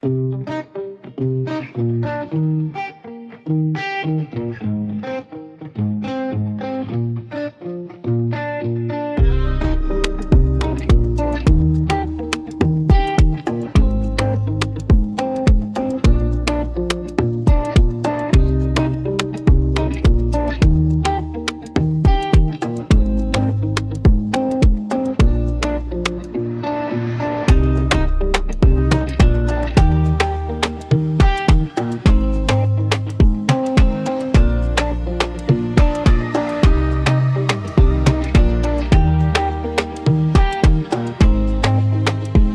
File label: beat